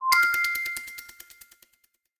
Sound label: Glistening, notifications; OnePlus 11